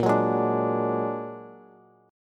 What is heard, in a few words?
Bb Augmented 7th Bb down Bass; Bb+7; strummed; JGuitar